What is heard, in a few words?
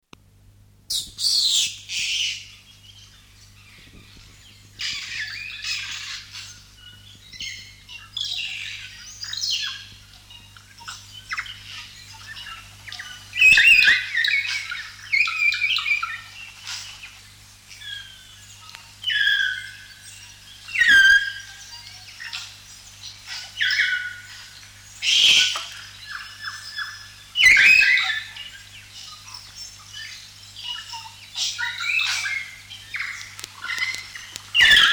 south island kaka; conservation, native animals, birds